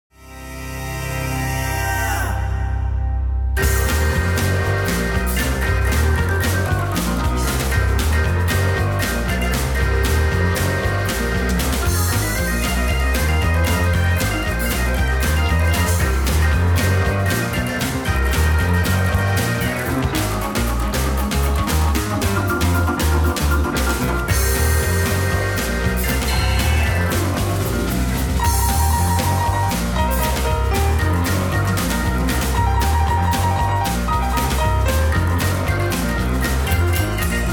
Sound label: Jazz hammond Stride piano